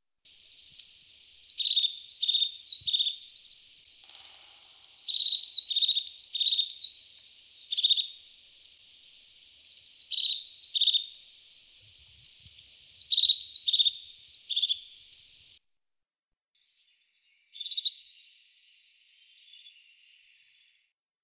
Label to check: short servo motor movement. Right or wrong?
Wrong